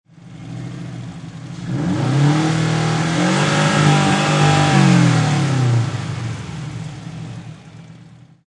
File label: Subaru Sti Engine Long Rev; Auto, Power Car, High Performance Car Sound: Subaru STI. Engine perspective. Engine revving; Race Car; Lynne Music